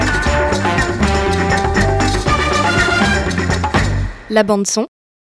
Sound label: jingle; La Bande Son